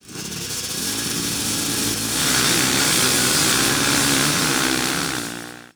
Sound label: start